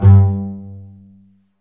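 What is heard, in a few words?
STRINGS, Cbs Pizz; Roland L-CD702; Cbs FX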